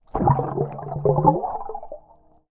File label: underwater movement